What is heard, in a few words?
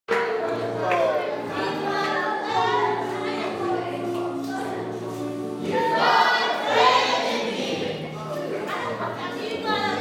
singing and signing assembly